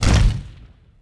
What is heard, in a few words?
pot hit stone lg; objects; Star Wars Obi-Wan; XBOX